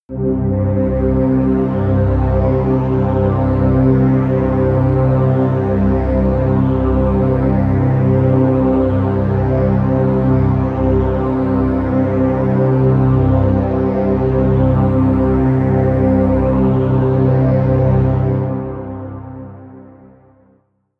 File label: pad sweep deep; flaechen